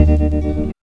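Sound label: guitar chord; E-Lab; X Static Goldmine 1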